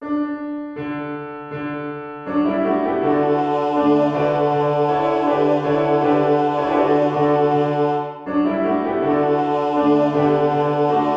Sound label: bass; franziskuschor